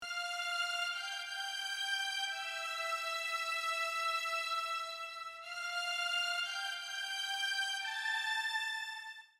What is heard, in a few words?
strings; Hip Hop /R&B instrumental